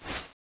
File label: pipebomb throw, weapons